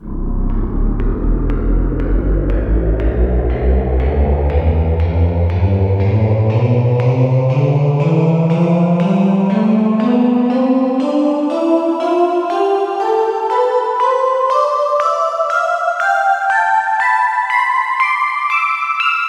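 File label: echo drops scale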